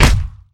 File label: Attack; muscle pepper